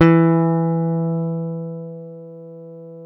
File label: E 4 JAZZ GTR; Univers Sons; Jazzistic